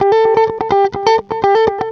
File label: 125 a, guitar licks; house; Vocal House; PS-28 Vocal House Samples; instrumentloops